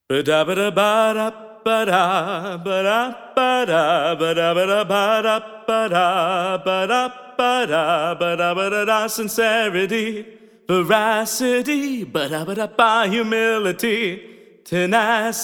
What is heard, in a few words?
Accapella